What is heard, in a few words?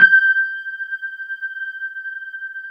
WET RD G5 R, Keyboards Old School; USB Soundscan vol.09; AKAI; WET ROAD